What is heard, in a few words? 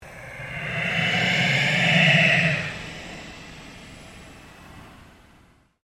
Ambient Pulse Ghost Chatter; Sound effects created by manipulating other sounds; Scary Soundscape; Scary Soundscapes